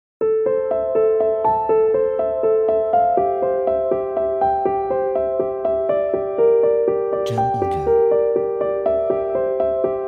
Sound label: Emotional Cinematic Piano